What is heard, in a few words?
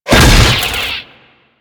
Staff Impact C, weapons